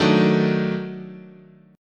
D sharp Minor Major 7th Flat 5th, chord

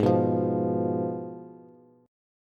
Ab Minor 6th Ab, down, Bass; Abm6 strummed; JGuitar

